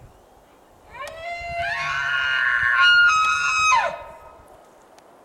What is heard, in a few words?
herd bull coming close, elk